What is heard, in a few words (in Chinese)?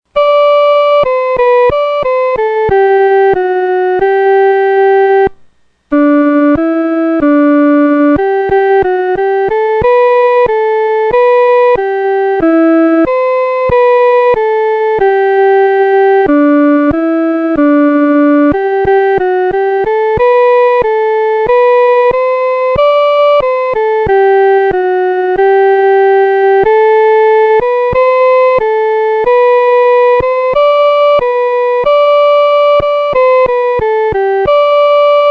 独奏（第一声）